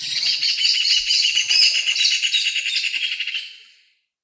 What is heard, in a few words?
Ovenbird